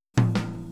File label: Boogaloo - Tom Rack, 82 BPM; Drums; Intro; Sonic Foundry; Drums from the Big Room The Mixes